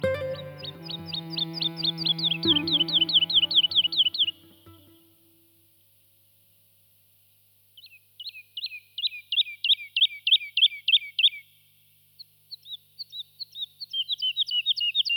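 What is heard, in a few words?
woodlark; lesnoi zhavoronok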